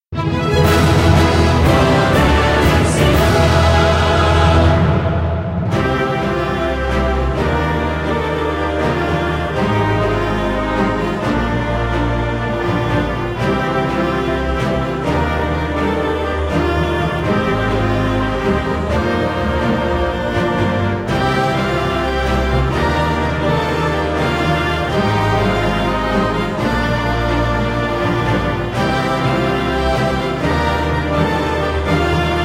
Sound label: Victory Fanfare; ffxiv; Orchestrion